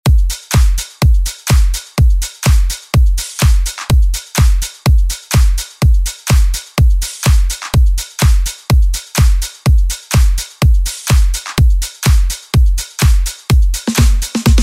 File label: Dance Bootleg